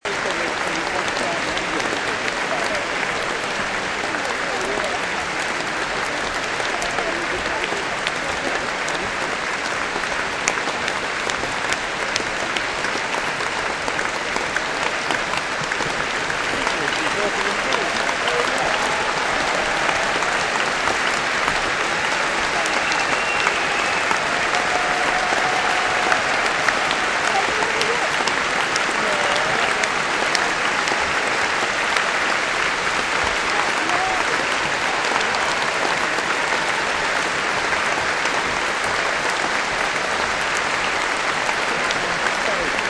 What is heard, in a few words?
royal albert hall prom applause